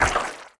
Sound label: resin, alien